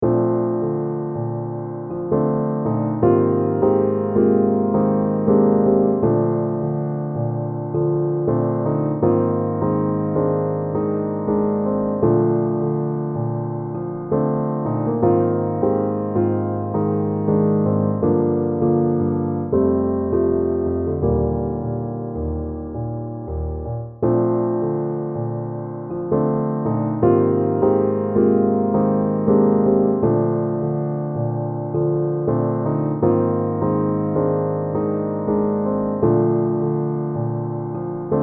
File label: instrumental